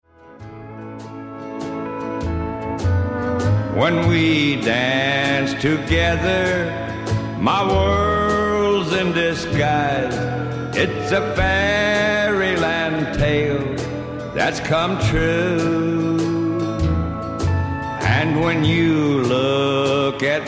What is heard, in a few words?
Waltz